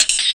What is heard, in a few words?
RSS, 160 BPM